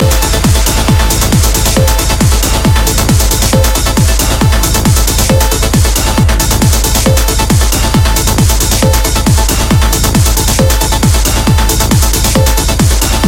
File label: dub tek